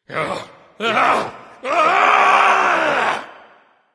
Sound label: fear; smite; anhur